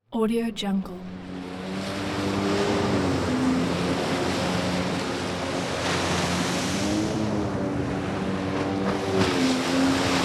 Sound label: jet ski